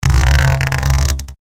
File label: Jail Cage Creak; gnarled forest